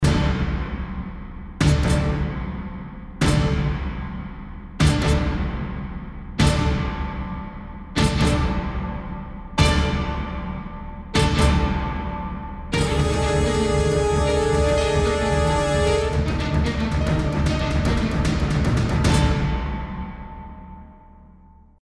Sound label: Trailer Stinger